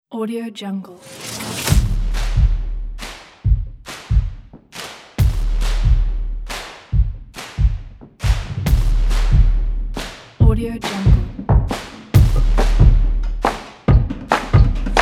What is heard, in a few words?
energetic uplifting epical drums